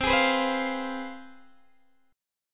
C Major 7th Suspended 4th Sharp 5th, Guitar, DADGAD